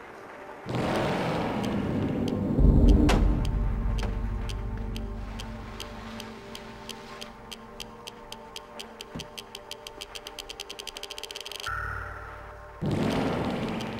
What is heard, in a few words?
gravity change; limbo